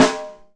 Buju mf, Drum Machines E-MU PX-7

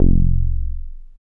Bass SH-101

